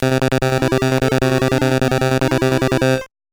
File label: Match Won; pro wrestling; nes